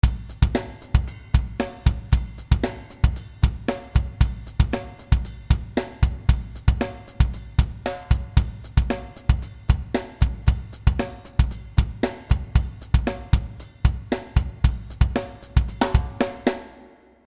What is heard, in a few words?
world music rythme